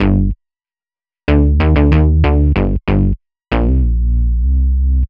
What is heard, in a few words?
HipHop Synthbass Gmaj, Hip Hop Bass Synthbass loop; Digidesign; Pro Tools Essential Loops